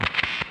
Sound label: guitar stop; Instruments; Zomboid; Lifestyle